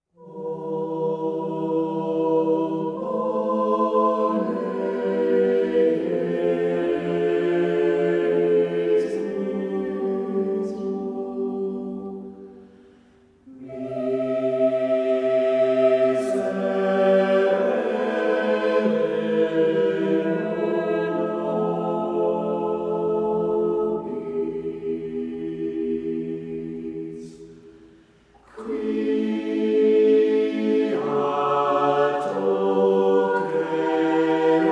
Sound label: Chamber Choir